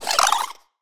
Tiny Creature Playful, Monster Sounds; The Decline Of Warriors; Monster Sounds Volume II

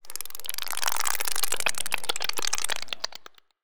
Alien Tiny, Monster Sounds; The Decline Of Warriors; Monster Sounds Volume II

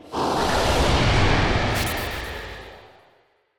Digital Creature Double Delay, Monster Sounds; Monster Sounds Volume II

